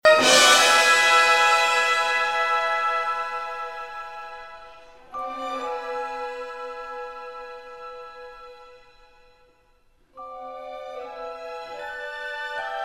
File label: ballet seq transition to scherzo